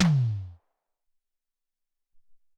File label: Hex Tom, Alesis SR-18